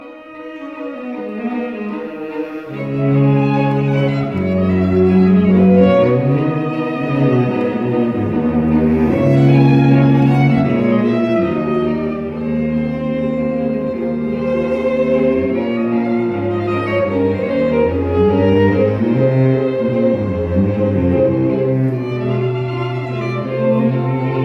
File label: string quartet; Borodin